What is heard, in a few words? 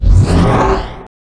blinkball impact; spells